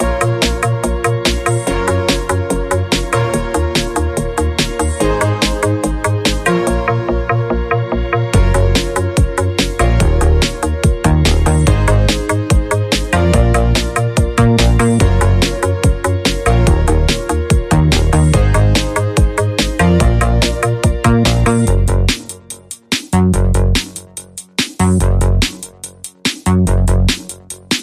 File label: Sci fi Synths, Rhythm